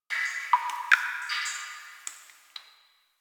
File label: Drip; Warframe; The New War; Zariman